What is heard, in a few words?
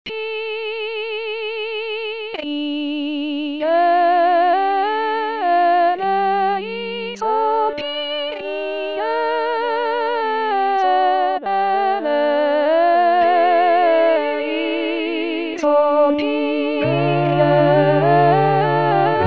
Messe Kyrie Tutti; La Messe O magnum Mysteriumde Tomas Luis de Victoria